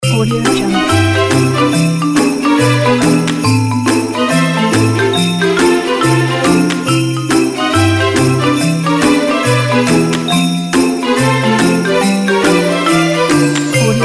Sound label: the christmas